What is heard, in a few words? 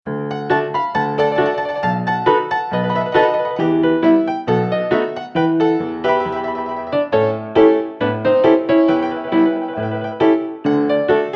Honky Tonk Piano